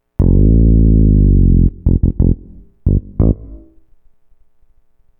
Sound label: Synth Bass